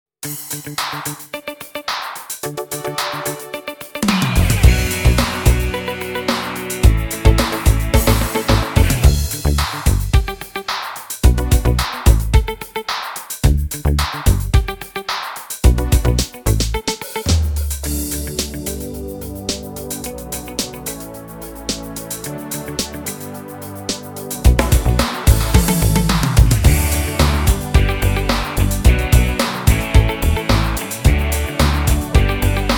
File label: Synth Rock